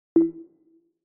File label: Error